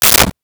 Light Switch, Bathroom; 1001 Sound Effects

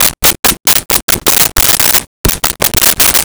Write on Cardboard with Marker; Office Supplies; 1001 Sound Effects

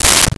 Duct Tape Tear, Foley

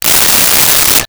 Cordless Electric Drill; Tools

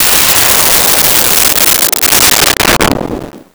Missile, Weapons; 1001 Sound Effects

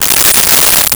Cable Tie; Tools; 1001 Sound Effects

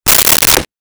Magazine Open; Office Supplies; 1001 Sound Effects

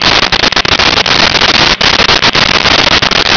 welding torch loop

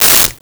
Hydraulics, Mechanical

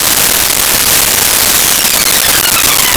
UFO Sweep; Transitional Elements

